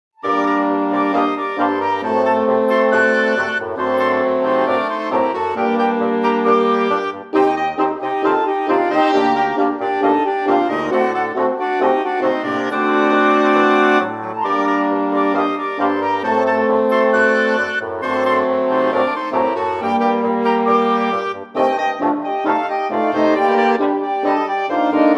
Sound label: Tango